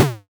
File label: bonk; GameDev; Snake